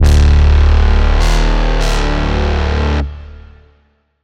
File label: Swipe; Routed Sound; Spire Expansion - Chaos Bringer Vol.1